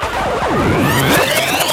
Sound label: Sweeps Short